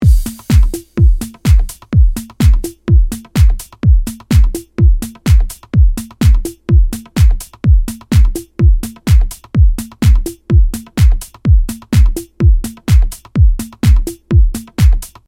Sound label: House Drums 126bpm; Zombie Rollerz; Pinball Heroes